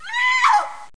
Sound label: discover civilian